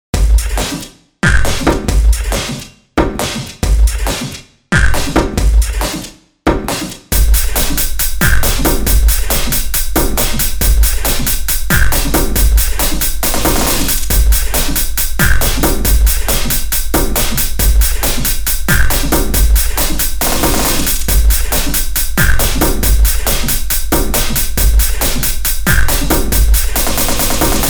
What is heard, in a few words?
drums test